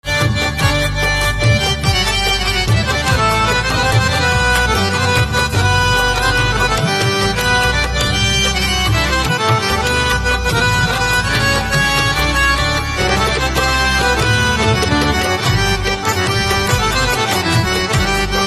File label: Harmonium Instrumental Ringtone